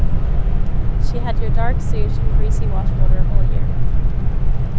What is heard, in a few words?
mix Car